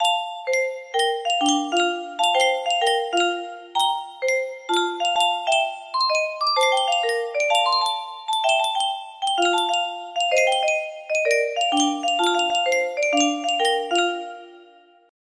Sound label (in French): yunsheng boite a musique, music box melody